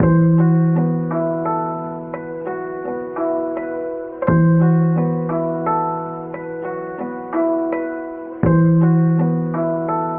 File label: guitar